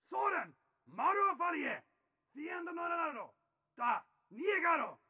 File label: warrior Shout